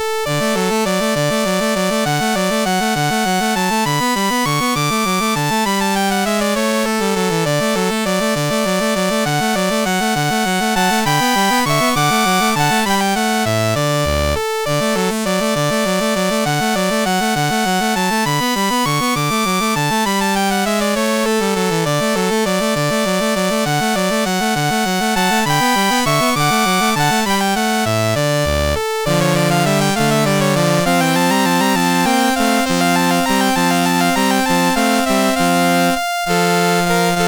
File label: Rondo in D Major, MOS6581R3